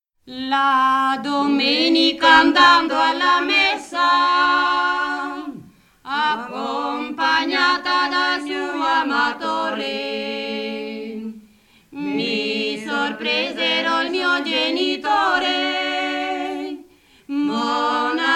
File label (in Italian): coro; brianza; la domenica andando alla messa